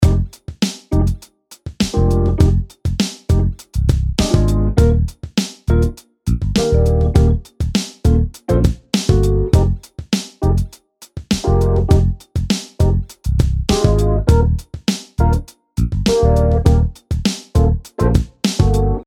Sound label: Rhodes Compare